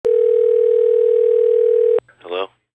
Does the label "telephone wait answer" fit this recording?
Yes